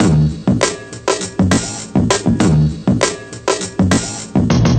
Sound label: Break 100BPM; Cocoa